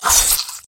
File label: skill hit